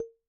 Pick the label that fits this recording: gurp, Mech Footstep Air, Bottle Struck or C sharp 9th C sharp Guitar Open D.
Bottle Struck